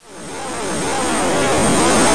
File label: freezer start, weapons